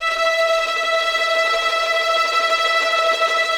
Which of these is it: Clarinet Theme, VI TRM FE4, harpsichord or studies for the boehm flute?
VI TRM FE4